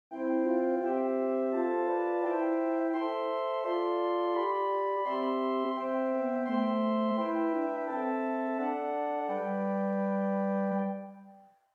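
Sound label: Swell Flauto Magico 4, organ stops; St Annes Moseley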